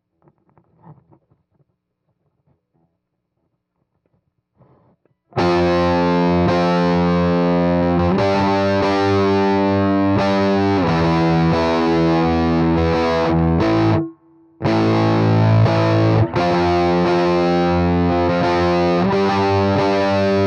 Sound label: Heavy GTR